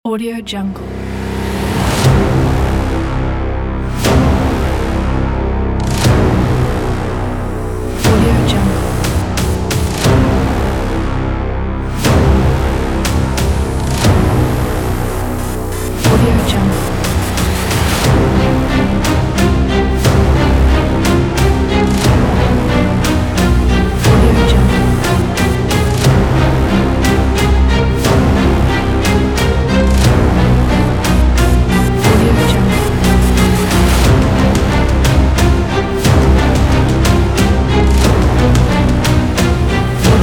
dramatic trailer

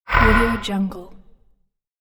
creature breath

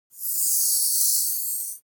office marker underline; foley; smartsoundfx

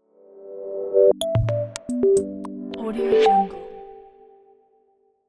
modern simple logo

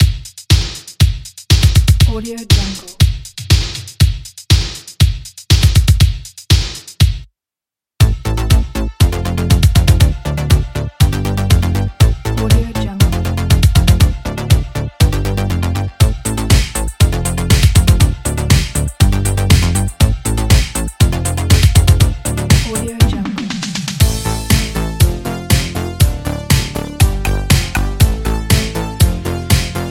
retro pop 80s

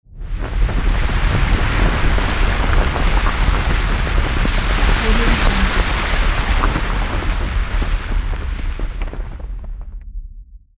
avalanche

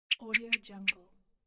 fast whoosh

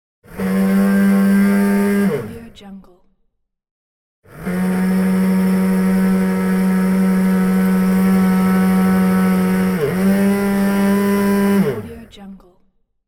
kitchen mixer

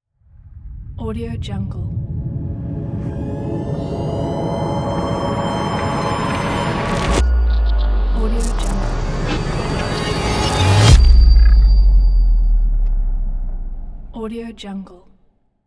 dark glitch logo

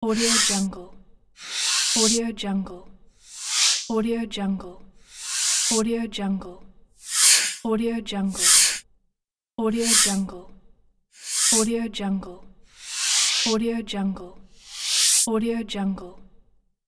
cybernetic whoosh console

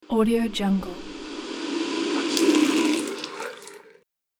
wizard summons water

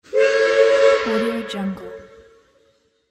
train horn

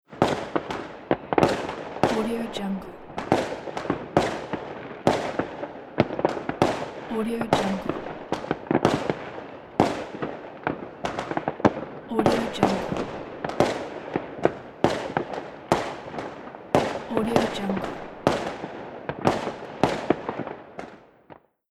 fireworks with closeby explosions new years eve

